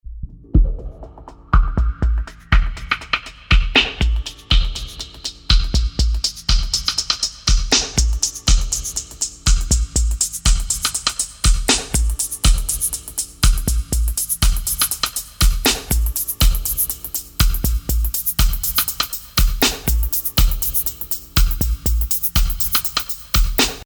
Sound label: Filter Ragga